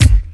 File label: 90s hip hop bass drum sound g key